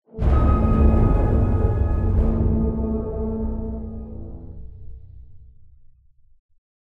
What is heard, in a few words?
Explosion Music Stinger; Stage 02 Explosion Predator